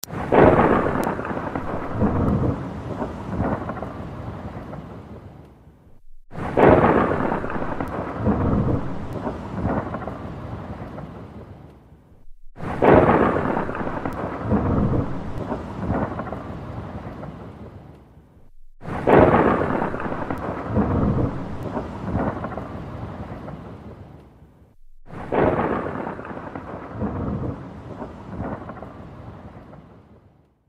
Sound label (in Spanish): Terror Truenos